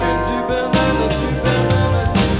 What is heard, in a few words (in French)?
jingle